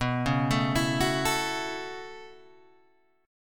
B 7th Suspended 2nd Suspended 4th down Guitar Open G, chord